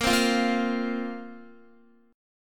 Bb Suspended 2nd Bb Guitar; Bbsus2 strummed

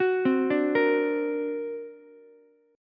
D Augmented 7th Ukulele, chord; 11,12,10,13; arpeggiated; JGuitar

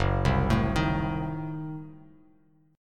Gb Diminished 7th, down, Bass Standard; arpeggiated; JGuitar

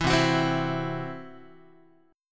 C Major Flat 5th, Guitar; strummed

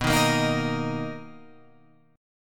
B Major 7th B down Guitar Open F; strummed; JGuitar

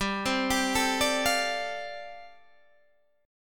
G 9th Suspended 4th, Guitar Open D

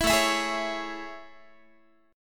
A Major 7th A, Guitar; AM7; strummed; 19,17,19,18,17,x

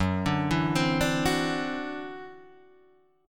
E 7th Suspended 2nd Sharp 5th, F sharp

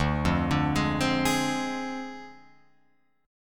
D Minor 7th Flat 5th, Guitar Open F; Dm7b5; arpeggiated

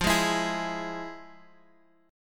F Minor Major 7th, Guitar Standard, chord; FmM7 strummed; JGuitar

